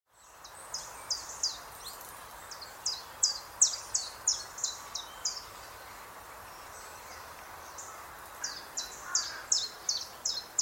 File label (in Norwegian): Gransanger; Arter